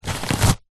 household tissue pull remove from box

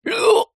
human male hiccup

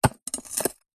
nature ice block sharp knife stab twist

